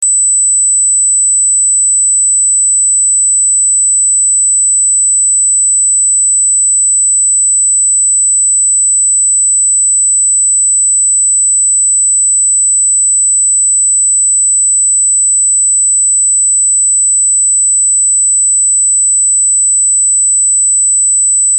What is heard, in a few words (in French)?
son aigu